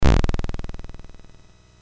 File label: BASIC sid MOS6581R4, GAMES; Sword of Fargoal